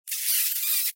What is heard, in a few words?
scorpion dying; minecraft; technic